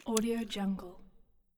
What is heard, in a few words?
chewing food